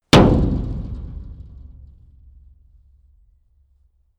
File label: metal impact tank hard